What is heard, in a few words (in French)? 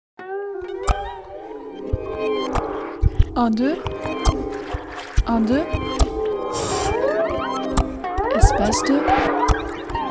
beatbox eau; Espace 2